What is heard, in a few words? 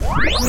Laser prep